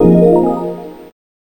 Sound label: Game Start; Raiden Fighters Aces